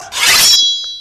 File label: Sword Sms